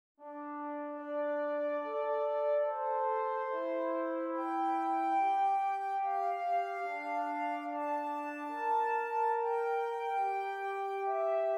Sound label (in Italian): Esercizi di contrappunto a due voci; dritte Gattung; Ronge pagina 60; corretto